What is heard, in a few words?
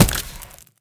egg Hit